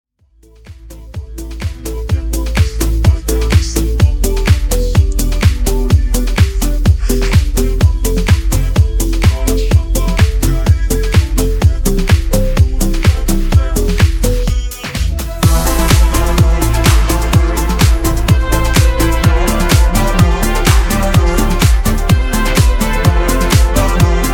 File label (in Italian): Groove Continuo; Sanremo 2024; Ver. Uomo